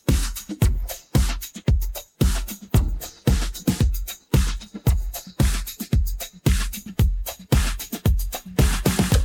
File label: drum